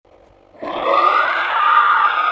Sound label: Pterodactyl